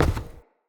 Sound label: Step Wood, Footsteps; Enemy; Characters; Corrupted Sproutfolk